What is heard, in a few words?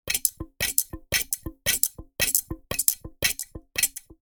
Garden hose nozzle pressing; hand tool